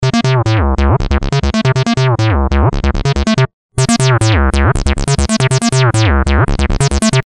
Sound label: feedback res